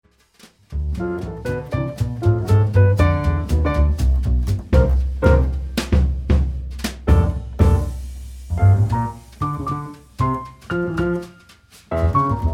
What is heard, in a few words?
Morse Code